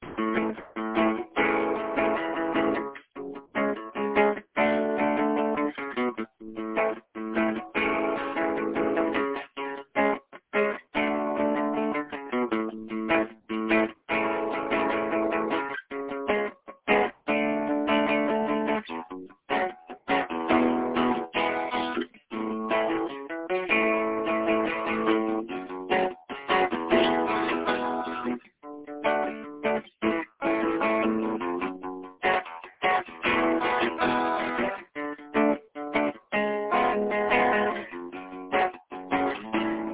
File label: chuggin engine interesting chords